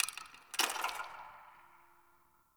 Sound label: Ice Crack One Off; Warframe; Ambience; Nineteen Ninety Nine